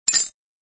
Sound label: error